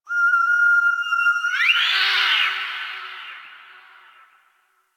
Distant Zone Four Creature; Warframe; Ambience; Eidolon Plains; One Offs

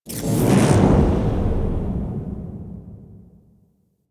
Diorama Landscape Transition; Duviri

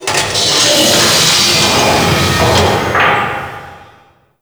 Ocean Machine; Ambience; Warframe; Grineer Ocean

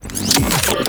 Briefcase Launcher Reload; Warframe; Weapons; Corpus

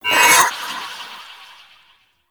Heavy Scythe Blade Accent; Melee; Duviri

